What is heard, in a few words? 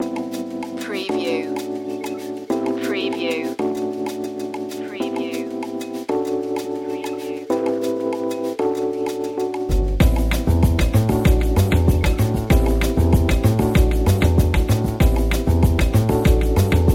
bossa del sol